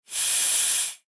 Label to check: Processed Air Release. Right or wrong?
Right